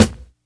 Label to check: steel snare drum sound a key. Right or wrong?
Right